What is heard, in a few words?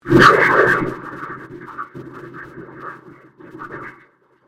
Convolution Surge; Sound elements to create more complex sound effects; Swell Sound Effects; Swells (Sound Effects:Sound Design)